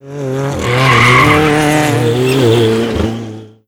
pickup donut; scn veh pickup donut; Modern Warfare

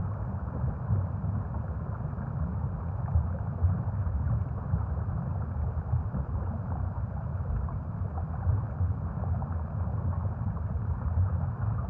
ambient; World of Tanks

